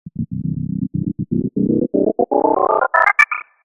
synth space weird synthy sci fi power up digital glitches; Jessey Drake